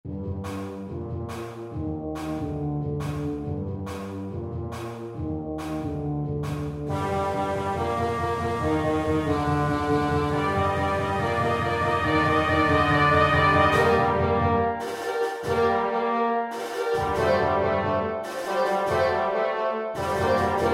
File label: drums